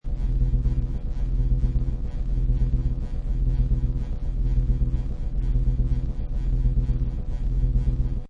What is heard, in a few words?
Reactor Loop, Sci-fi machinery or core. Gyrating, rotating, humming; science equiupment or space engine room. Seamlessly looping; The Reactor; Science Fiction Sound Effects; LynneMusic